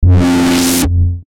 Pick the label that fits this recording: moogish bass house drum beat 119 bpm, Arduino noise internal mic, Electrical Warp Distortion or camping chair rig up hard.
Electrical Warp Distortion